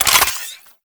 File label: Modular Secondary Handle Recharge Finish, Guns; SU Modular Guns; Handles